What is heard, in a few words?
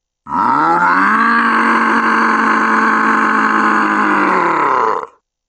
wryyy